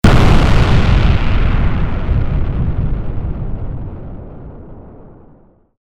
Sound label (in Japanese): 爆発 モノラル; 武器効果音